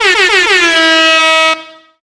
air horning

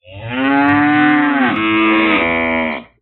animals cows

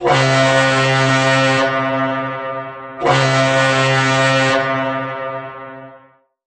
war horn

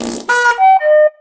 comical fart toot flute

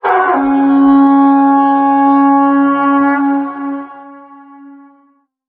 vicking horn call

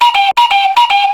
CRAZY CUCKOO OUT OF CONTROL

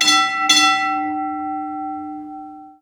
train beller

